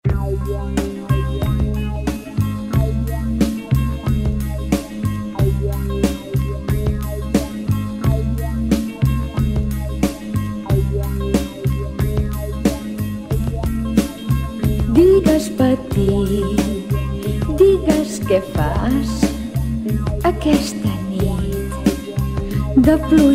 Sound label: soft breaks; catalan